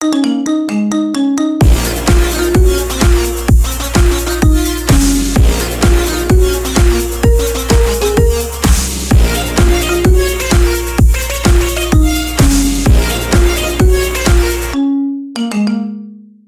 marimba remix

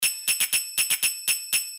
castanets

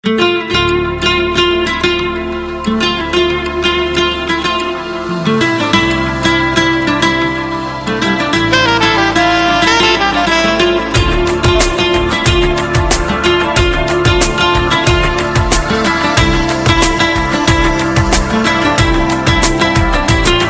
acoustic and slow

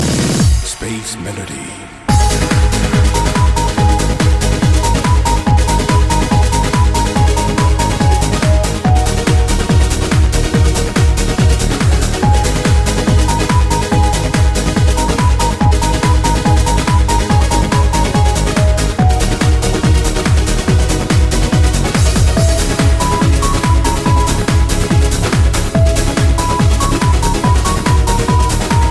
space melody

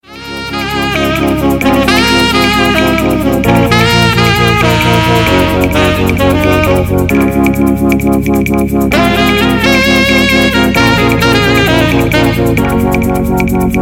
instr

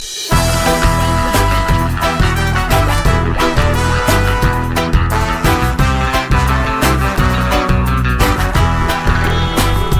reggae

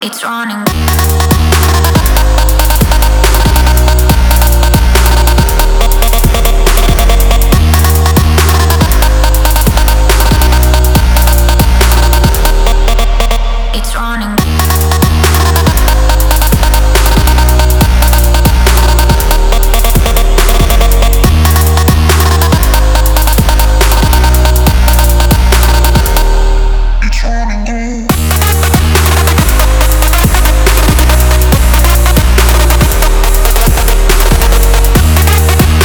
trap

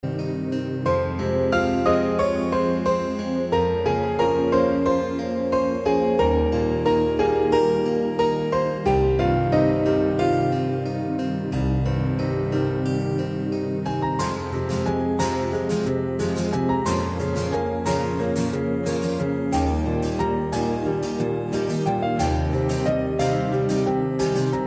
tumhi instrument

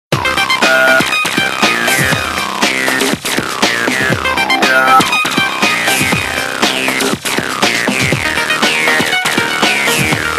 nokia space age

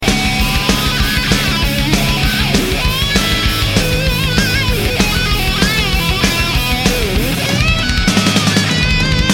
power metal solo

